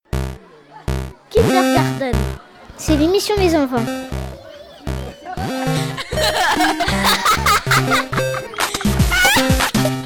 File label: jingle; kindergarden